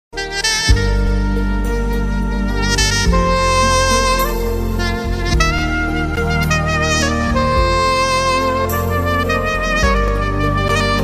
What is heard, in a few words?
sms Ringtone; titanic